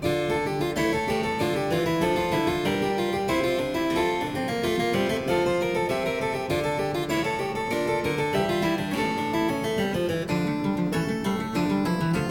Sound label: Suite in D Minor - Variation, Harpsichord; Suite No. 3; HWV 428; Handel; Suites for Harpsichord